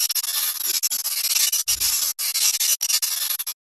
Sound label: Electronic static and fizzle